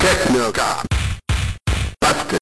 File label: techno